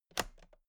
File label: toolbox latches close regular; industrie wirtschaft; handwerk